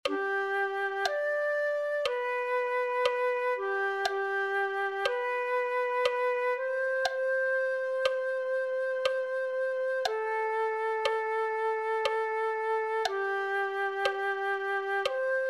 flute 1

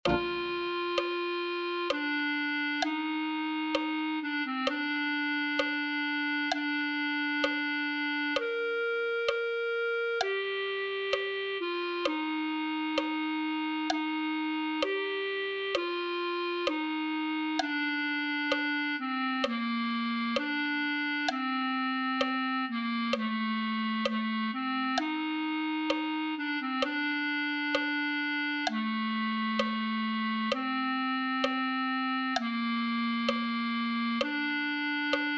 clarinet

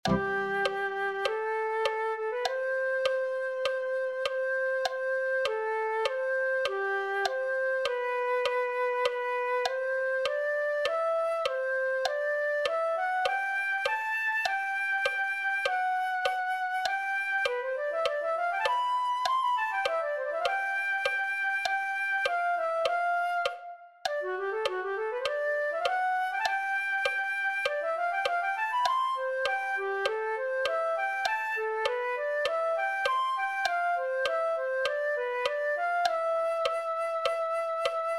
flute

